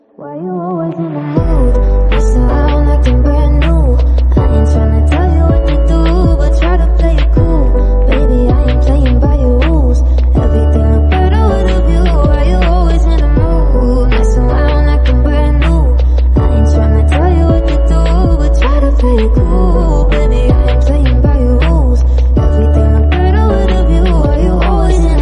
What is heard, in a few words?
Mood lofi Song